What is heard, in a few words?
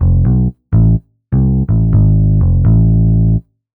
DEEPHOUSE BASS 125 A